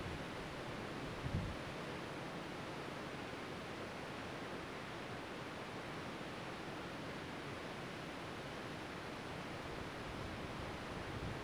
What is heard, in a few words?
taughannock upper falls